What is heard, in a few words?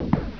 jump; amphetamine